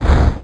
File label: fire, magic; The Elder Scrolls III - Morrowind; Morrowind GOTY GOG